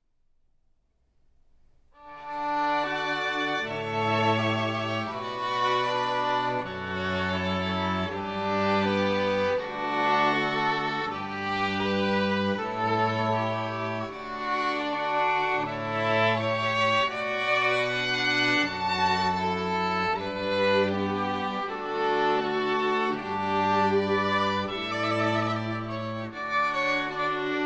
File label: String Quartet; Dallas